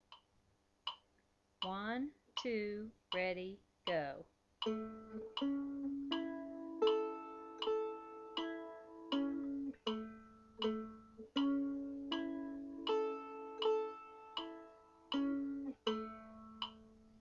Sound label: A Scale Arpeggio 80bpm, mandolin